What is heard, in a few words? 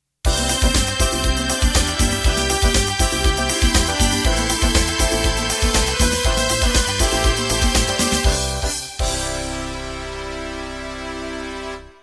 Disco Jazz End